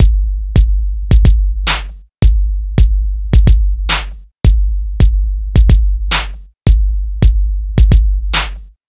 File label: Ditty; NBA Inside Drive 2003; Xbox